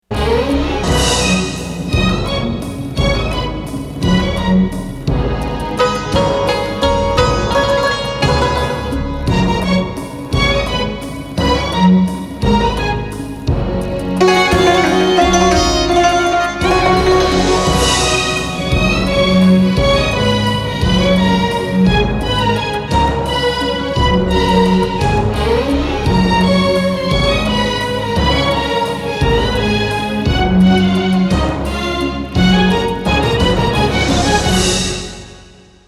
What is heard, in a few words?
Instrumental